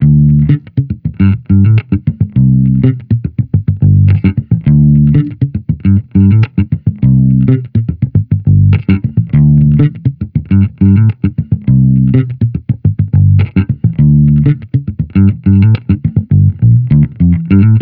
Amped loop 103 Dm, Electric Bass Loops; Slate Digital BASSHOLE Sample Pack

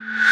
tonal oneshot A; Pop; Slate; PASADENA Pop Sample Pack; Tonal One Shots

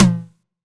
Tom Slap, 909 Toms; Slate; Vintage Drum Machines Volume 3 909 Edition

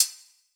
Closed Hat, 808; Slate; Vintage Drum Machines Volume 2 808 Edition

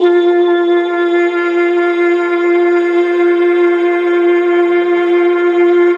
tonal oneshot F; Slate; SD PASADENA Pop Sample Pack

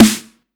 Snare Switch, 909; Slate; Vintage Drum Machines Volume 3 909 Edition

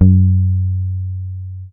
Bass one shot Muted F#, Electric Bass; Slate Digital BASSHOLE Sample Pack

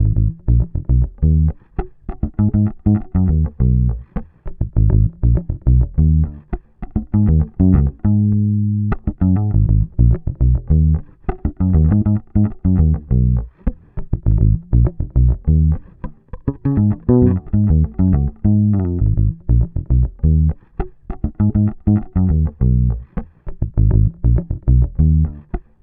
Bass loop 101 Abm; Electric Bass Loops; Slate Digital BASSHOLE Sample Pack

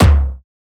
perc aggro; Drum One Shots; SD MIDNIGHT Sample Pack; Slate

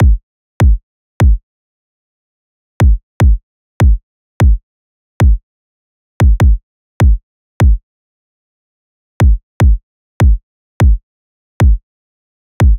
kick loop 75; Pop; Drums; Loops; Slate; SD PASADENA Pop Sample Pack

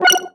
tonal oneshot F#; Pop; Slate; PASADENA Pop Sample Pack; Tonal One Shots

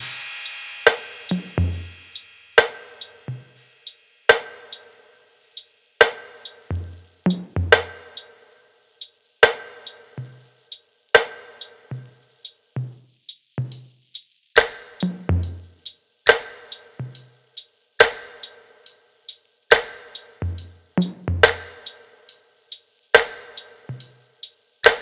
tops 70, Drum Sample Pack Loops Top Loops; SD SEISMIC; Slate

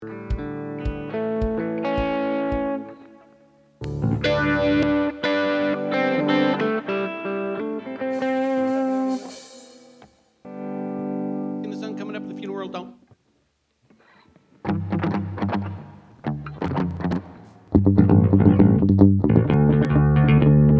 Strings

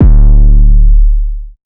808 one shot D; Slate Digital BASSHOLE Sample Pack

